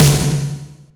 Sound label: SR Snare; Yamaha PSR E305